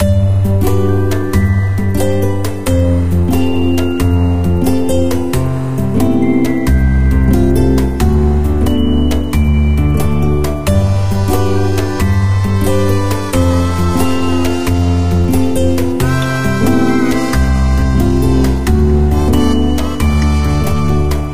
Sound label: main menu music; 摇钱树